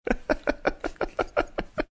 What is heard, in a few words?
chuckling quietly